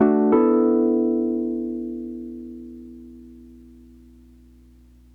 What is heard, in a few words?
wurly ending, analog funk; Sonic Foundry; What it Is! 70s Analog Funk